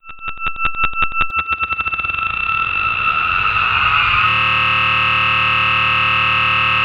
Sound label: motor or something